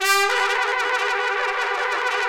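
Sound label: trumpets FX, Brass; Roland L-CDX-03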